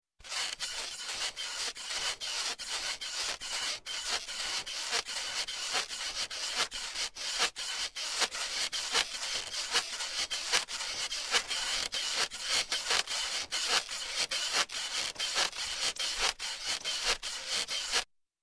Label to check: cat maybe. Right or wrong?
Wrong